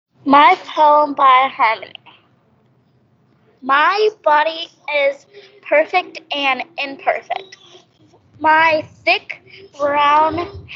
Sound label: Harmony